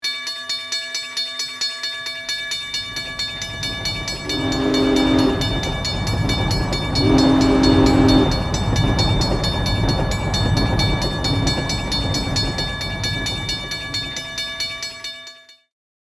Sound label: Train crossing bells approaching train; vehicle